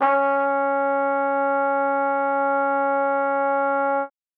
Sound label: trumpet c#4, Trumpet piano; Session Instruments